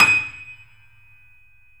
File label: D#, The Piano; InVision Interactive